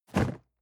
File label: cardboard box medium fall low rustle; objekte